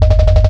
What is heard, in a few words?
tank; Desert Storm WMD Sweeper